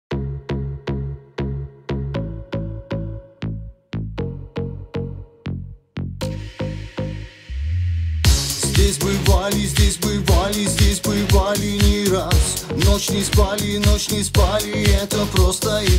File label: Russian Dance